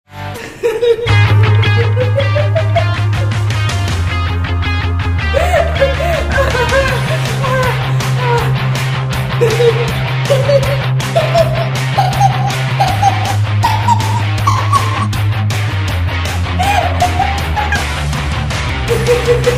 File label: ROCK